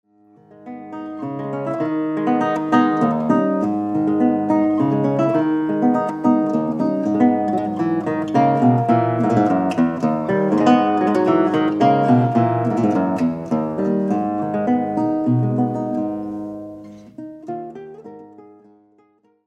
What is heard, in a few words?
Bolero; 1837 Gutierrez; Julian Arcas